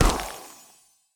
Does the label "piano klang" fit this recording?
No